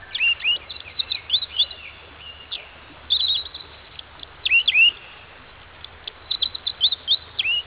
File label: bird